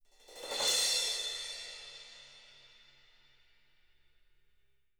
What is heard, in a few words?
CYMBALS